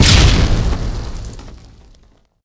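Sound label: grenade explode; Explosions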